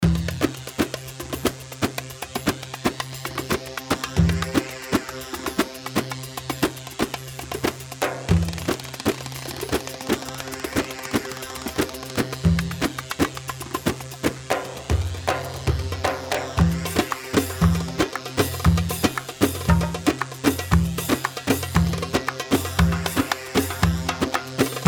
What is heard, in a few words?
Malfuf 116, Darbakati, Sharqi; ملفوف